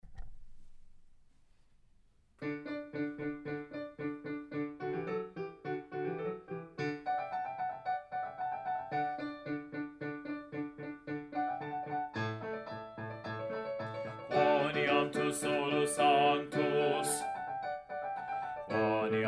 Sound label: Bass; Quoniam